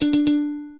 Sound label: slot machine bet